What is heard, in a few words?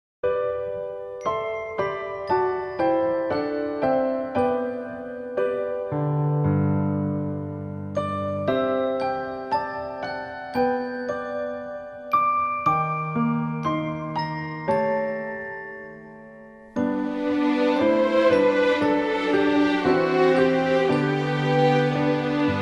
Cheer Up; Project Octopath Traveler